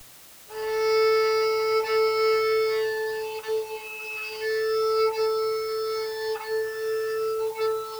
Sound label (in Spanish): La 440 rebab harmonico; PEA-Sintesis de habla